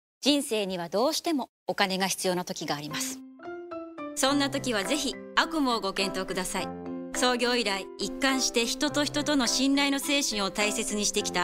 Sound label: rabindra sangeet